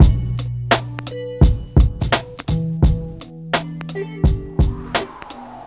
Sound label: Loop